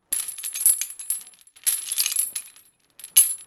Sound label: Prison jail prisoner transfer chain metal rattle